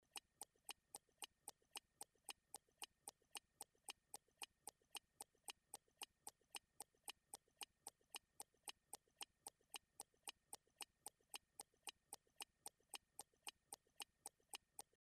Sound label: clock tick